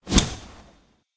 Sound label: swing; Smite; god Khepri